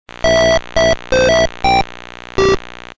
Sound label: ppu tune